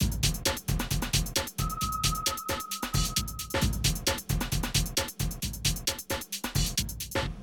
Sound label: Drum loops, Rave & Hardcore